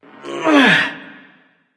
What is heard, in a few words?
nv grunt lift; cernunnos